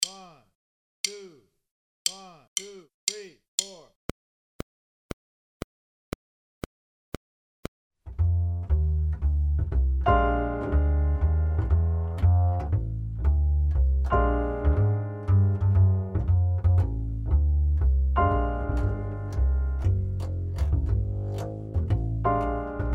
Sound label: Swing, Drum Fills; Essential Drum Fills (Erskine)